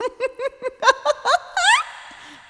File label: laugh